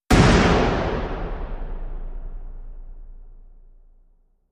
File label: Drums, End Hit